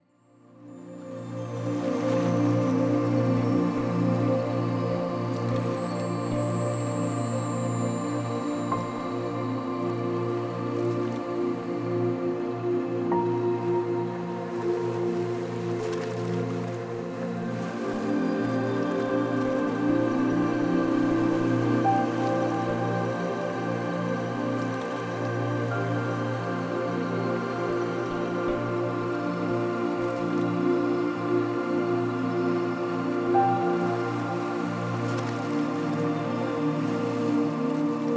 Медитация инструм. фрагм.

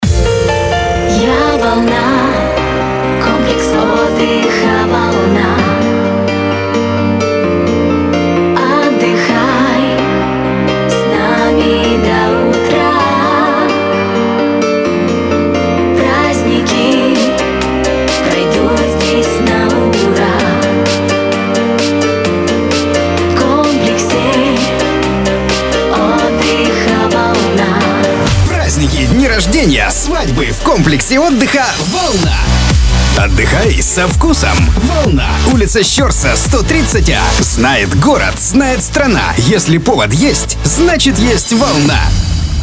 Джингл Волна

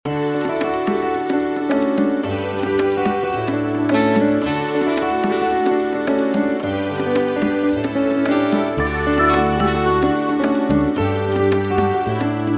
Летнее настроение, отдых, море, пляж, теплое солнце, беззаботность

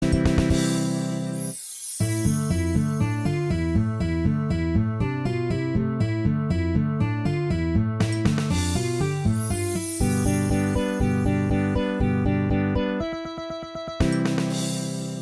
Проигрыш

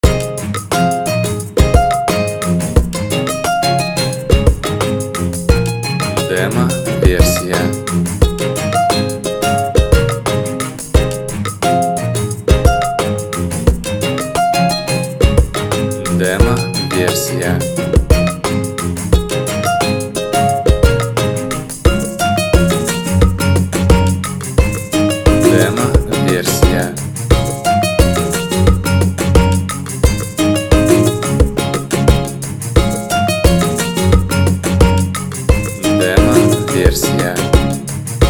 Мелодия укулеле

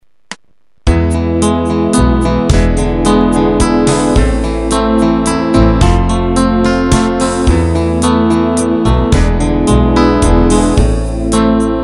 Мelodia

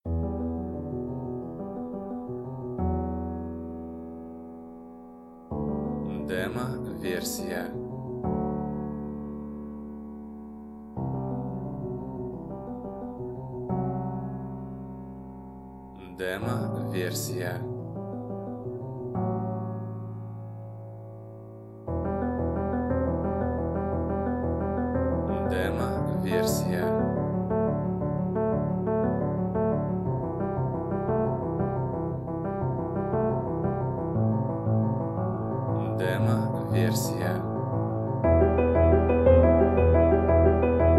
Пианино

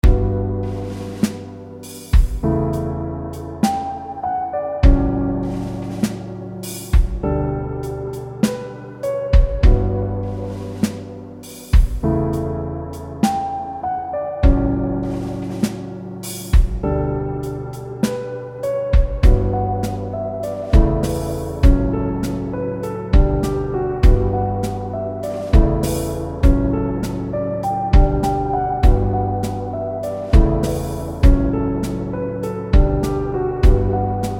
мелодия Кошмар

